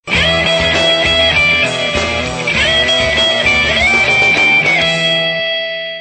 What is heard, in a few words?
Rock Band